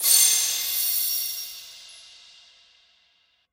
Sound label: fs Intro Start; parthenon